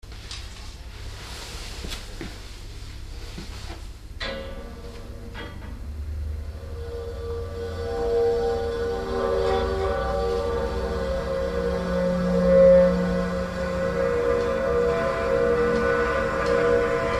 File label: Sound Design recording complete; La prima registrazione sperimentale, completa, eseguita con un minidisc